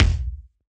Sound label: Kick Acoustic Room